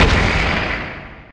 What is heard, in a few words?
asteroid damage carbonic big; Factorio